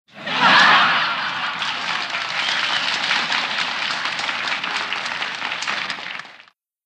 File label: Crowd Applause Laugh; medium